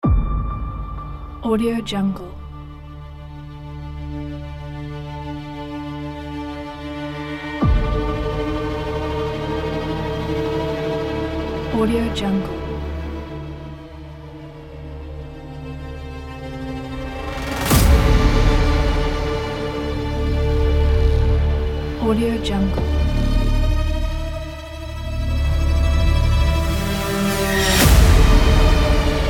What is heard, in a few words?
scary trailer intro